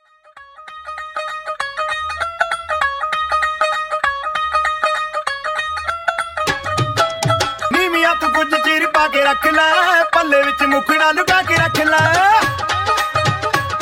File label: Funk Tranny 98 128Bpm